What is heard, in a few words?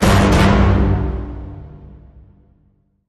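Hit Orchestra Tutti Double G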